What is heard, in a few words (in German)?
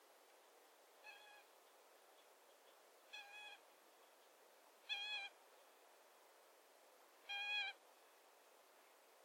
blaesshuhn